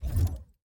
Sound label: Hand Paddle Release Light